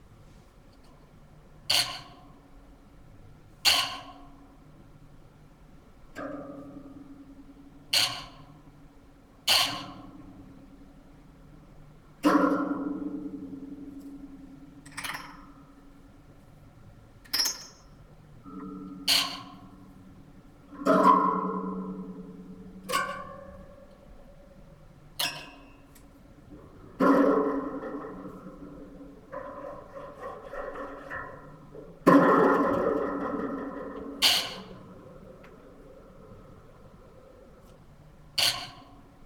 improvised guitar pieces

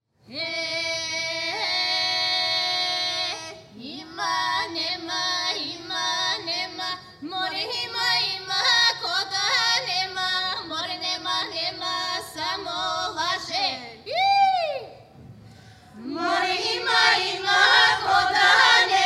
Bulgarian Womens Choir; Ima Neme; Live at the 2014 Golden Festival; WFMU

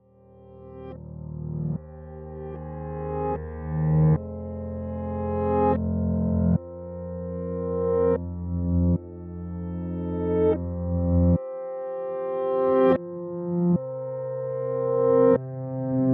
Nervous Tense Building Piano; CUES for Film TV Games etc SOLO PIANO - or mostly piano

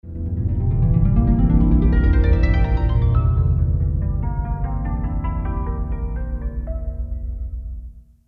Miracle Jingle Slow; Jingles for films podcasts

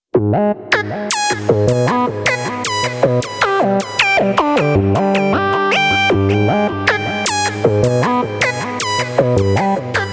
Quirky Fun NO percussion; CUES for film TV games etc

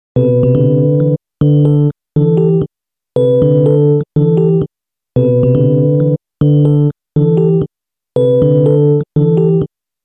jingle; LaTele

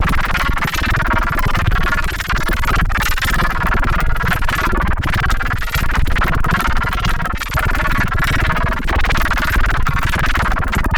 kick explorations

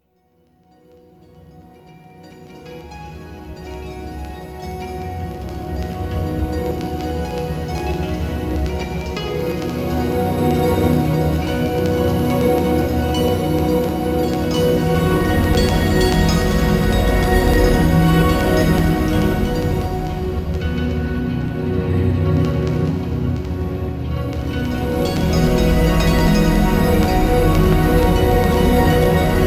Processed Harp

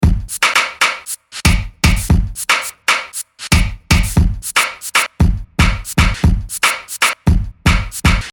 ragga break drum, 116; Loop Mania Sampler Pack

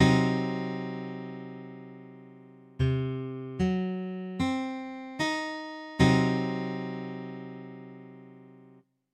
Cdim, Guitar Chords

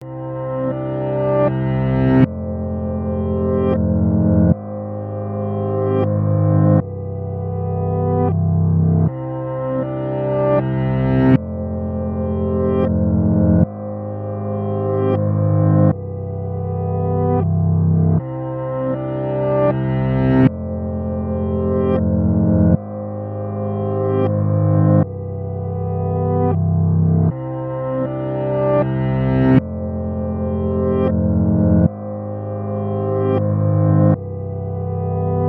Sad Loss Grief Dark Piano backmasked; CUES for Film TV Games etc SOLO PIANO - or mostly piano

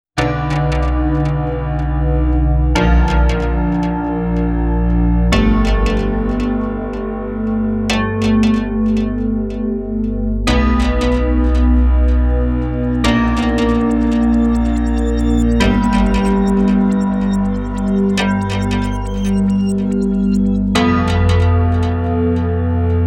Unease Tension guitar synth piano; CUES for film TV games etc

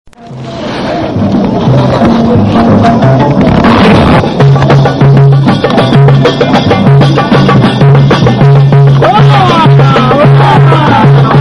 more street drums, Morocco; Badly recorded performances from Morocco 1999-2000

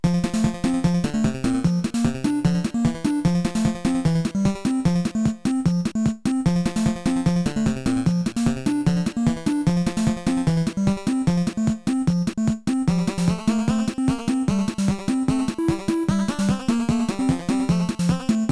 Western Loop

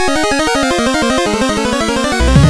Battle Intro

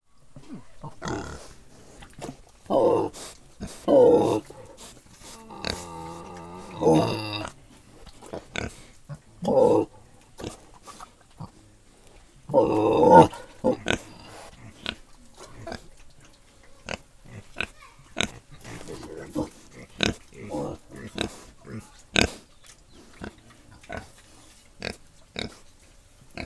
Pigs, INDONESIA; Les Cartes Postales Sonores; NOISE ON EARTH Vol5

